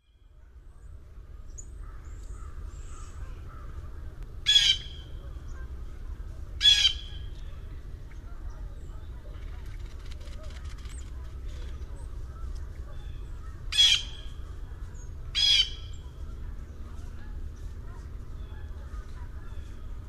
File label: Bird call at Merkle Park